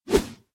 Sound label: snatch